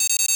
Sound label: autoturret target acquired; Rust